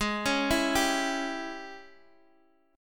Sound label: G sharp Major 7th Suspended 4th Sharp 5th, Guitar, Open G; x,x,6,6,5,5